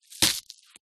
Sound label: leek slice knife on wooden chopping board